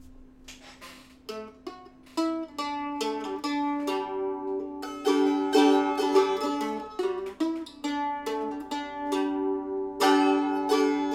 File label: mandolin noodling